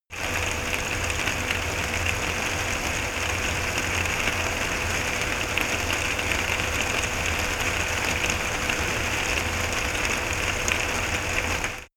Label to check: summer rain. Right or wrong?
Right